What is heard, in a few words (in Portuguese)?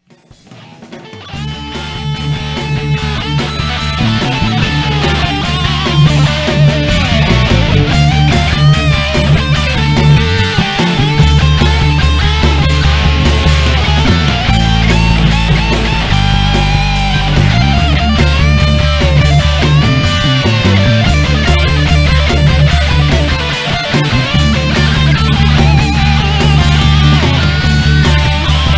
vinheta